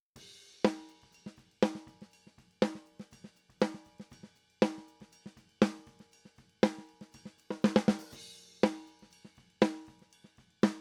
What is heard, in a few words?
Snare Top; Reaper project